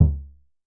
Drum X Kick, Pearl Drum-X

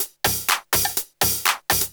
124bpm, House Perc Loops

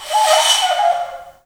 AF FLUTES, Heart of Africa

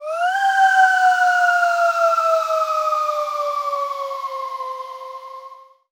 sfx sci fi; Masterbits - Switch FX

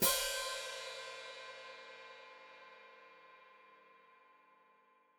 Hat Closed Open, Hi-Hat; Cymbals Stereo; Deeply Sampled Drums

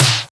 Snares; Vengeance - Essential Clubsounds Vol.4

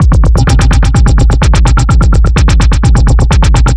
Tech Glitz, Drum Loops, 127 BPM; Sonic Foundry (Sony Creative Software); Underground UK House & Electro

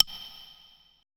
E HIBLOCK, ETH PERC

